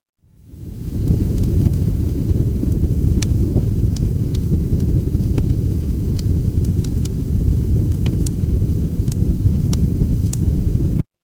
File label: ambience fireplace